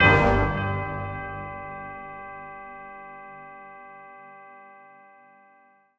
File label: Stab Brass Bell Gmin